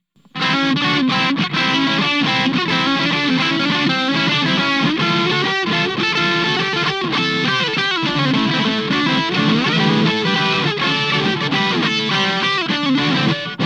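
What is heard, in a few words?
TS808 humbucker bridge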